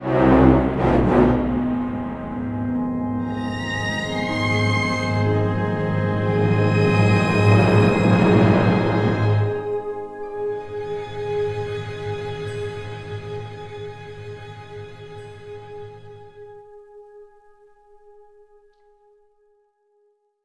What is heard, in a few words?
Cleared, Music; Diablo II - Lord of Destruction; Baal